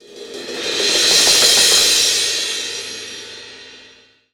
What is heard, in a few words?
CYMBAL, CRASH; Cycroll; Sonic Foundry (Sony Creative Software); Loops & Samples Drum Tools; Laid Back